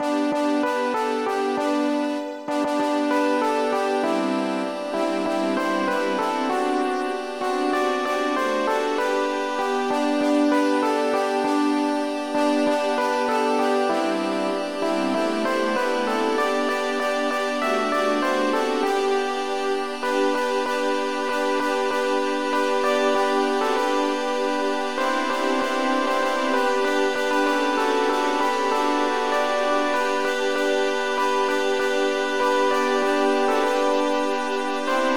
jingle

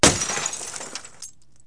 Medium Glass Shatter, Cartoon; 1,000 Sounds (16-bit); Crashes & Crushes

